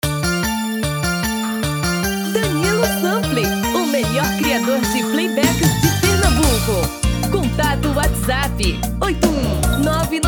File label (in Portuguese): Brega tom feminino